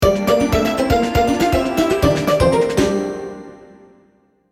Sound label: jingle